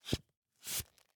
Hand bellows, leather, wood, brass, open, close, wheezy, blown air, supple, creaky; Eiravaein Works; Vaeyan IV